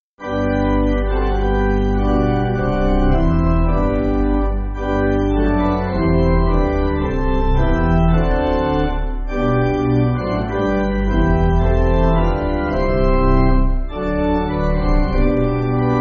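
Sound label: Pipe Organ, church music; We Are Now Meeting; Farrer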